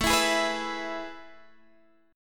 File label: A Major 7th Suspended 2nd, Guitar, Open D; chord